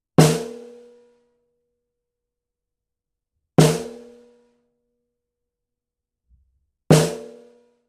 Snare - C414 BiDirect - Edge modelled; bidirectional (osmička); edge duo srovnavaci test

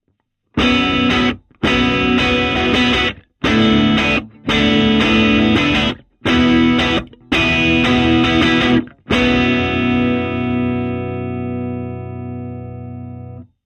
Guitar Box - C414 Omni - Edge modelled; edge duo srovnavaci test

